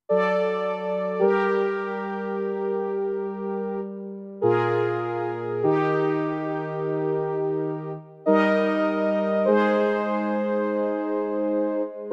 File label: Synth Brass